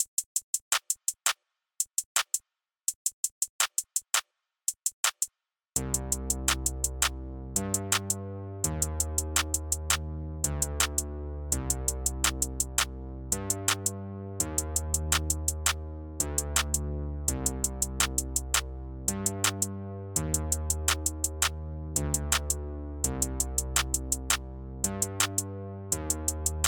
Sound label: Instrumental; Friday Night Funkin' vs. Beluga Windows gamerip 2021